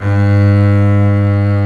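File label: Bass legato Ab1; Digital Sound Factory Virtuoso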